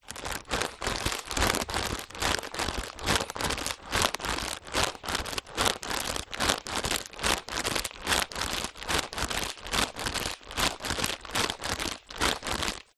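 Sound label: human breathing into plastic bag hyperventilate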